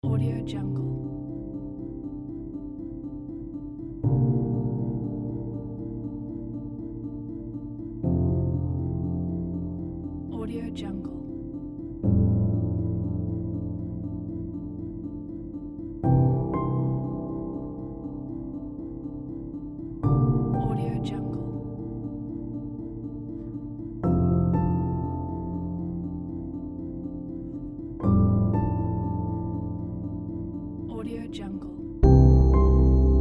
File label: warm and tender ambient